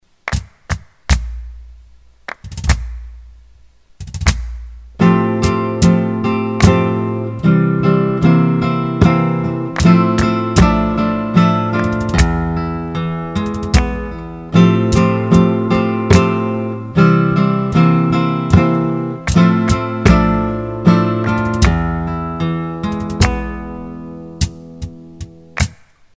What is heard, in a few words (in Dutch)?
Soleares compas met variatie in akkoorden